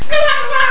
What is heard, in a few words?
wabble wabble, parrots